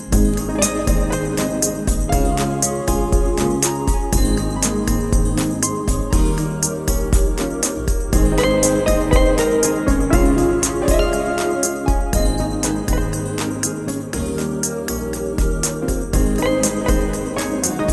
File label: Bossa Nova